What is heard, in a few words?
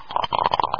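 several dice rolled in hand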